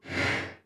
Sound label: karte zoom out; magic 2014